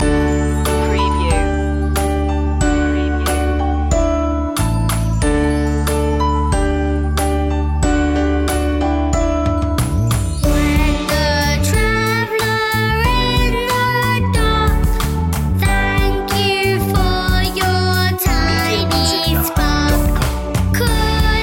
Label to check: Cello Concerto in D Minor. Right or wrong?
Wrong